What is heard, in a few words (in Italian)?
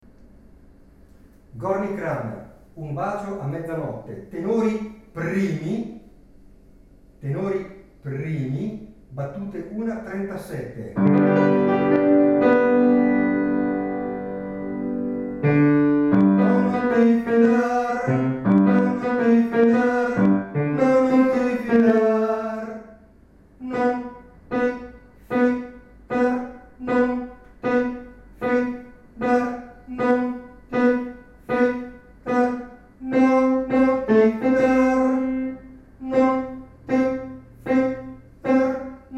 Tenori